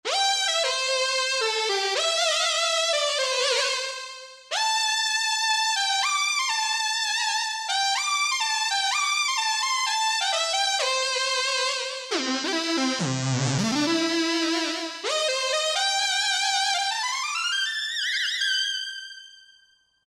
Korean, Roland JD-990; Factory Patches

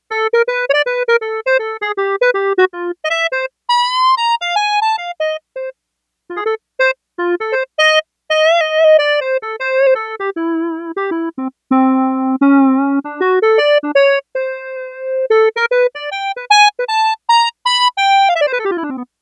Clari Solo, Yamaha TX802; Factory Patches; Voices

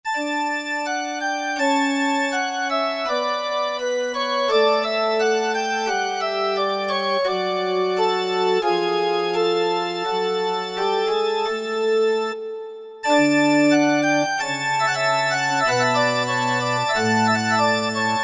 Pipe Organ, Korg MSC-9S Organ

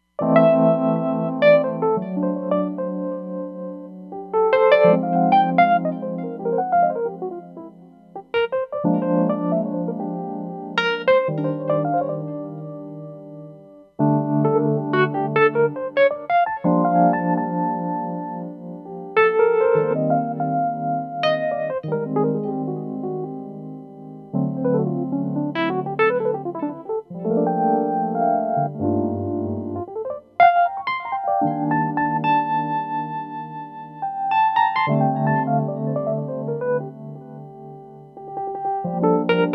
VelPanWurly, Roland Fantom XR

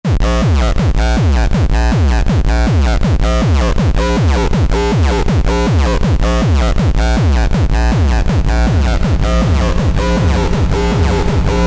Kick & 303, Novation XioSynth

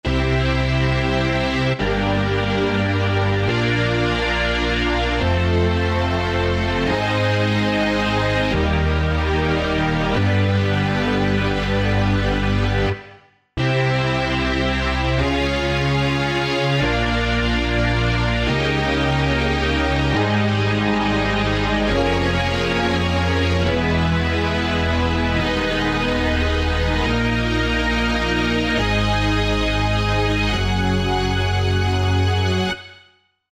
Tape Strings, Korg TR-Rack

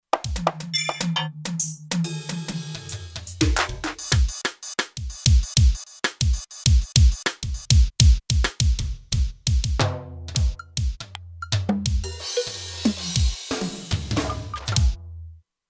Slam'n Drums, Kurzweil K2500X; Factory Programs

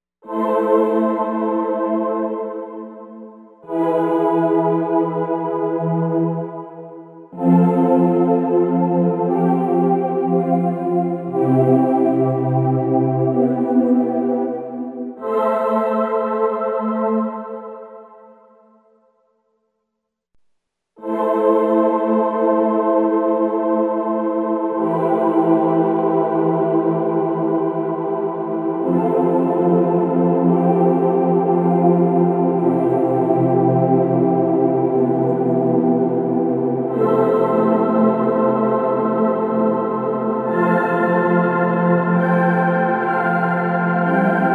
REVERB

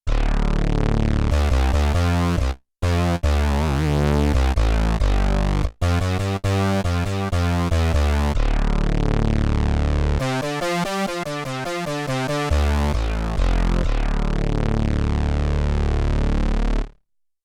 Mono Bass, Prophet 5; Yamaha TX16W

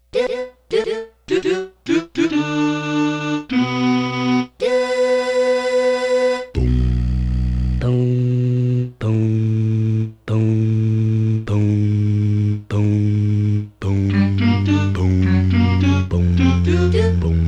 Bum Voice Do Voice

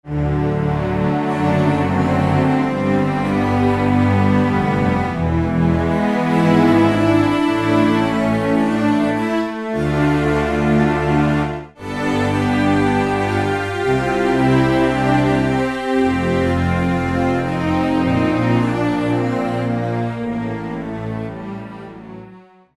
Cello Orchestra; Roland L-CDX-04 Strings And Orchestral Percussion

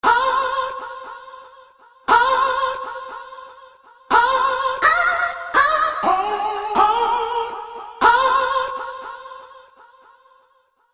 Aaaah!, Roland SR-JV80-06 Dance

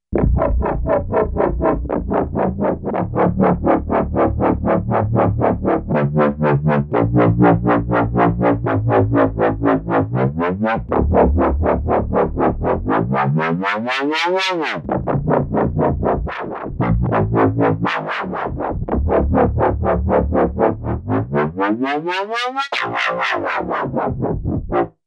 Bass

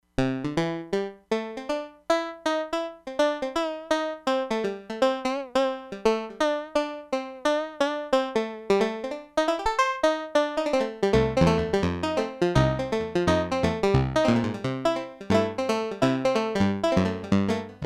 BANJO, Crumar Bit 99; Factory Programs

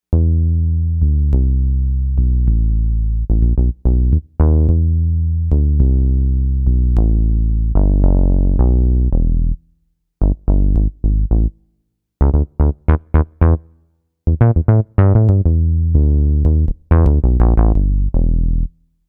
Mild Guitar Bass, Novation UltraNova; Factory Patches

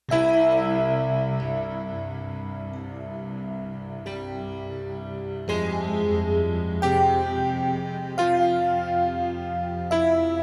Six Ambience; Digital Synthesizer

